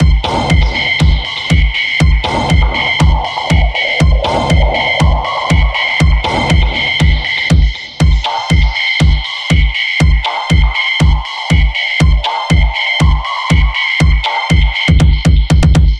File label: 120bpm Hypnotic Beat